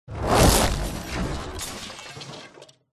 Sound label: impacts designed whoosh into wood metal glass break